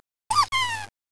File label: children victory; valve